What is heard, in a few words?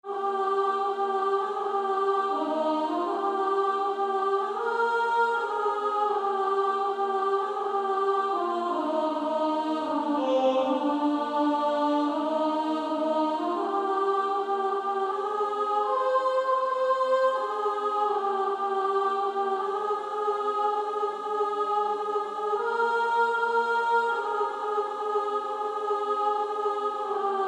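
Female Choir, soprano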